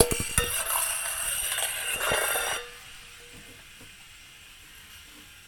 clang

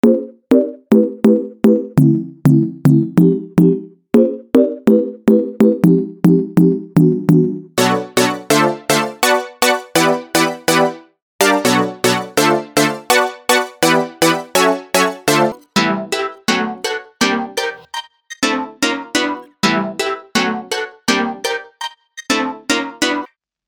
chord loop

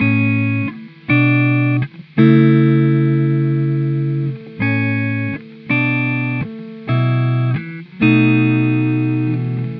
a 98bpm processed

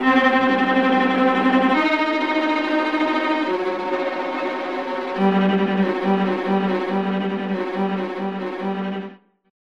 viola tremolo

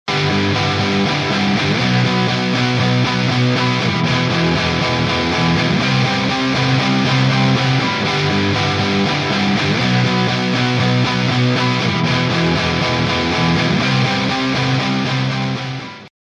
shepard tone guitar dry

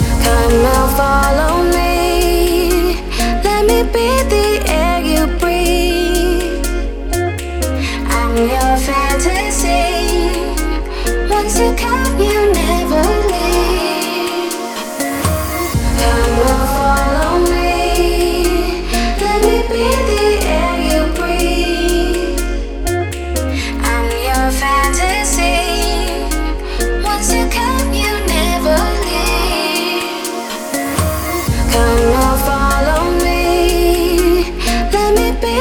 lead vocals

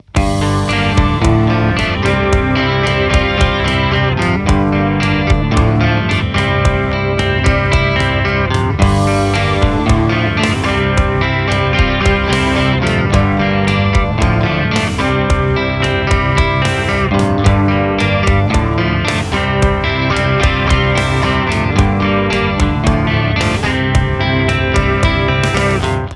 pop

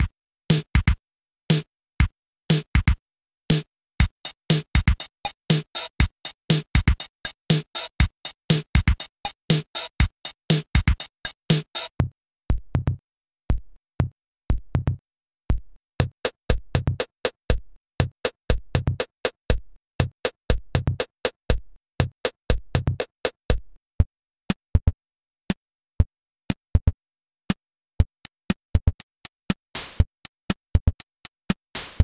synth drum hits; psp

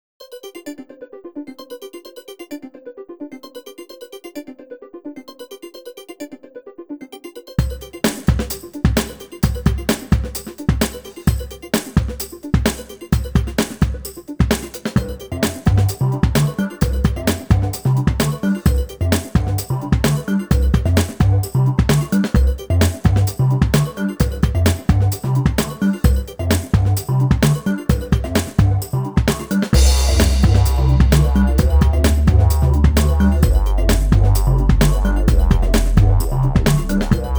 breaks

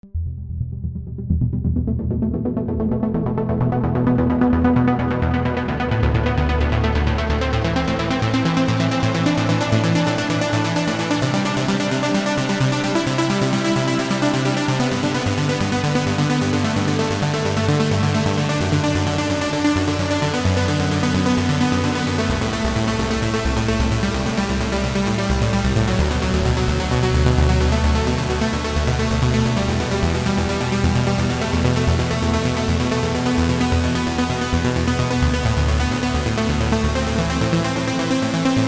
trance

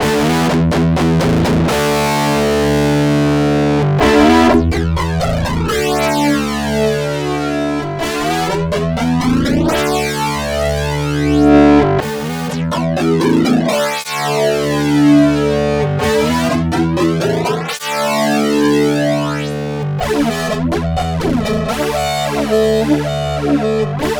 electric guitar; dipole

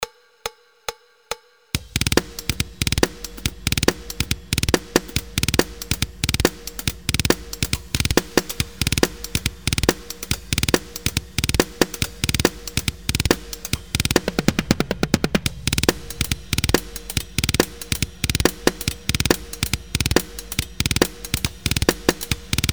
drums; taldrum; icebergdrums

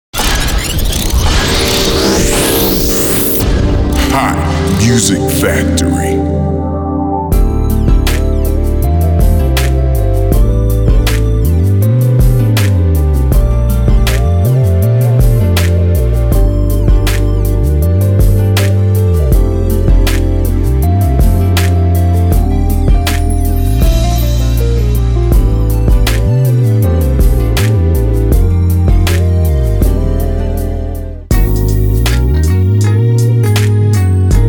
soul rhodes

